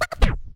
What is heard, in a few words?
quick Stop; scruffy duck